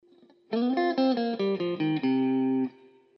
zagrywka blues wejscie na bridge zagrywka C C, gitara

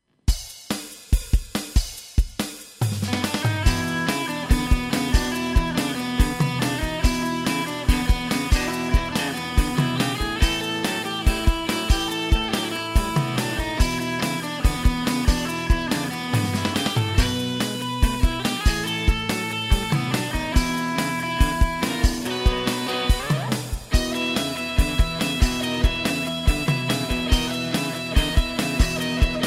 rock and roll melodia

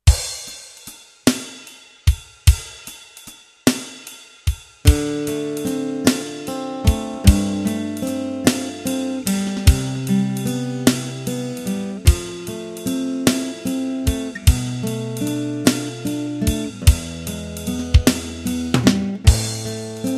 pasaz 6/8 wstep

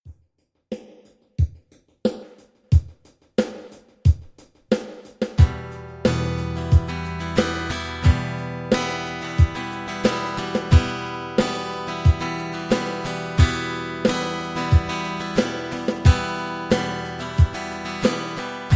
bicie e moll z rytmem, gitara

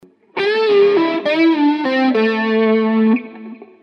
git. elektr. pentatonika a moll zagrywka dwa podciagniecia

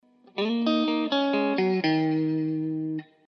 zagrywka blues wstęp es-moll

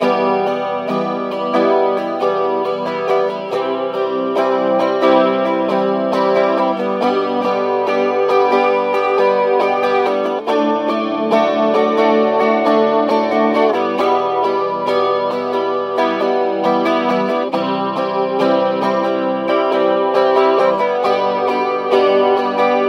bicie akordy e C G D, gitara

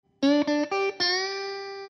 zagrywka g-moll, gitara

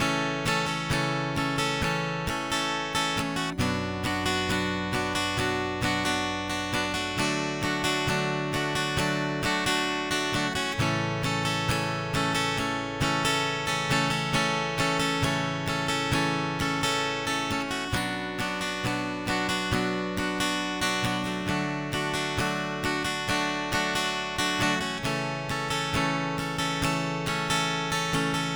bicie AhDA 2 powtorzenia; Lekcja2.1

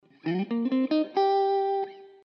zagrywka blues G-G

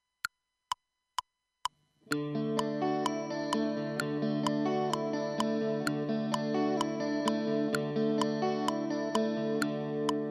wstęp akord D

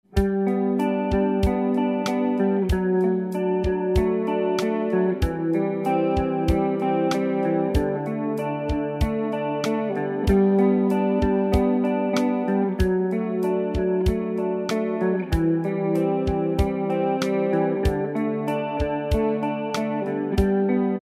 podklad

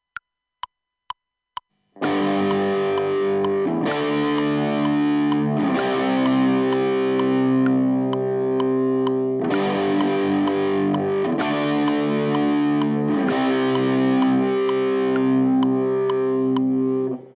refren, gitara

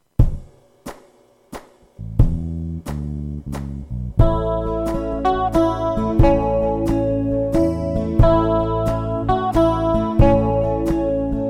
cicha noc podklad A-dur z melodia

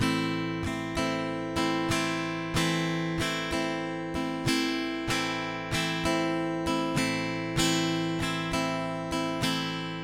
bicie 4 4 5 uderzen, gitara; Lekcja 1

